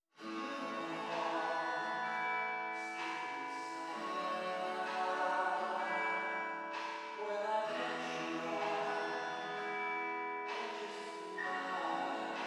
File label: Sustain Return